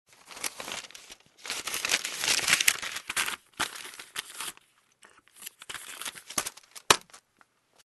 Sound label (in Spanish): abrir carta sobre